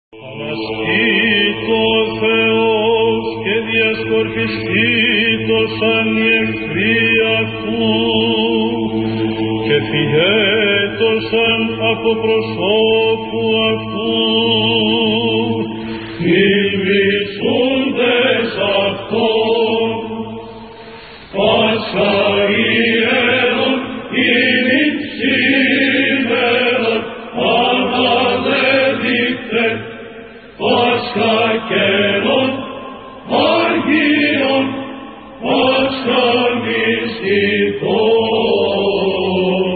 Choir Pascha; Hieron; Pl A

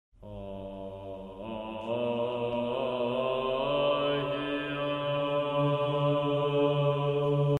Apechema Agia, Choir; Batopediou

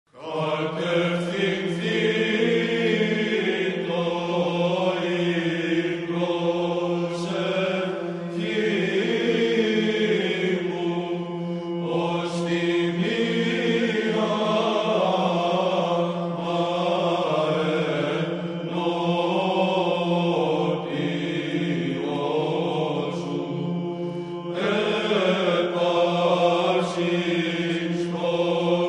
Choir; Adelaide; Katefthinthito

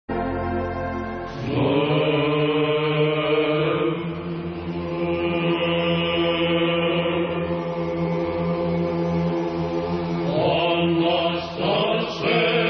choir heirmos Anastaseos imera

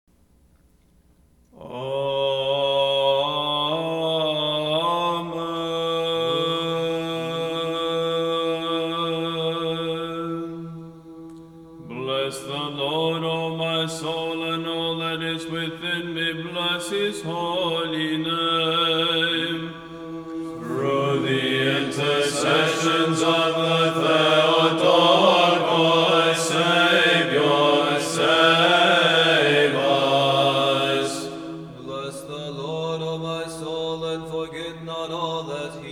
Choir

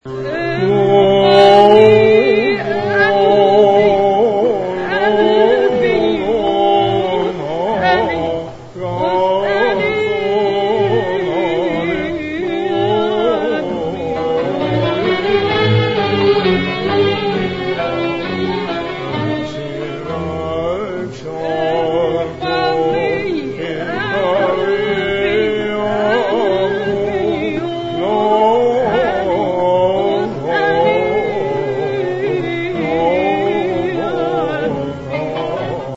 Logon, Arabic; Bestenigar

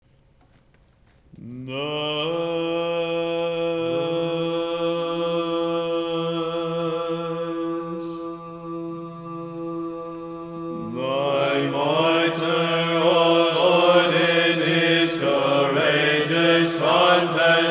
Choir

